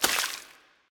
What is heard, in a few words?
defeat splash; smite; khepri